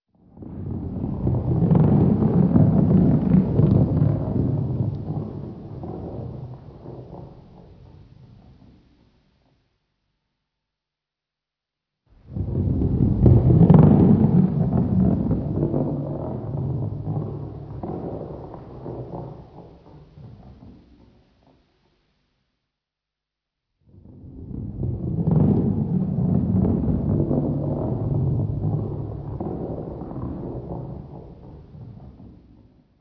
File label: Heavy ball rolling